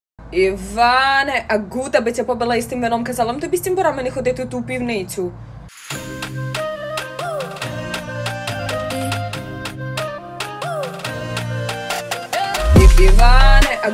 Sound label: uk drill beat, FL Studio